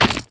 mech armor land ice; Factorio

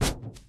mech armor land grass; Factorio

